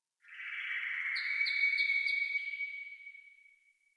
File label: Tree Distant Critters Happy Calm, Ambience; Warframe; Duviri